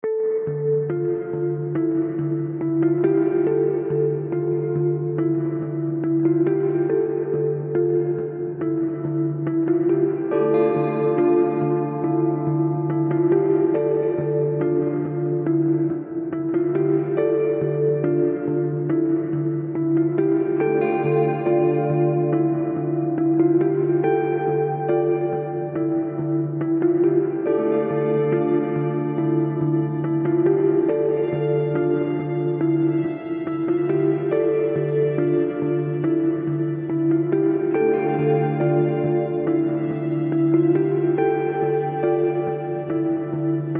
cold mind enigma crime mysterious detective music loopable